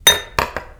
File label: plate place